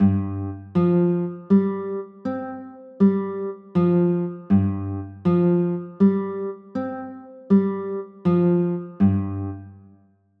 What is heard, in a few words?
g 7sus4, chords